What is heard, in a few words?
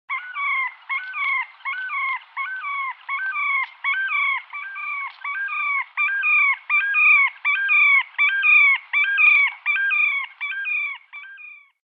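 terek sandpiper voice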